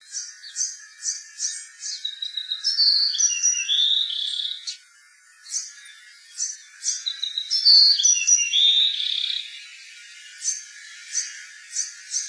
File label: Bewicks Wren